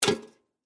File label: Teapot Grab Up, Household, Teapot, Grab, Pick, Up, Empty, Metal; Kitchen